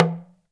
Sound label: TALK DRM, Heart of Africa; Spectrasonics